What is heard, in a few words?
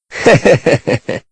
hehehehe; twewy; Sho